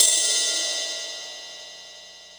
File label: Ride, Ensoniq ASR-X